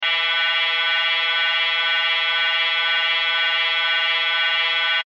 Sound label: Sci Fi Laser Saw Beam Ray Stream; Blastwave